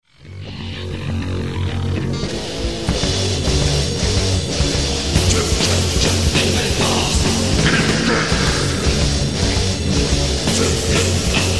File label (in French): basse chant